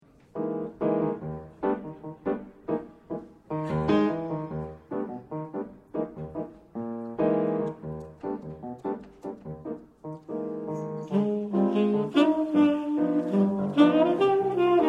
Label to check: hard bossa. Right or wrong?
Right